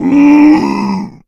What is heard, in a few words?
boomer death